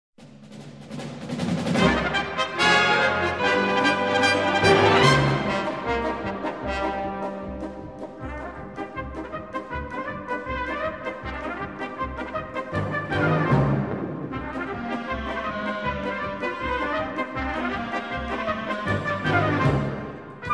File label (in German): Trompeten Alarm